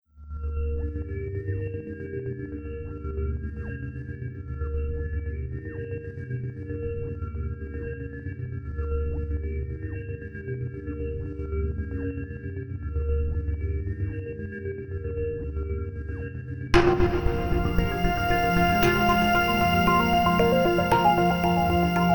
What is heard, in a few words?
Foam; Noisebiscuits